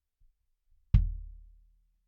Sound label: BD In